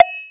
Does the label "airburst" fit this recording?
No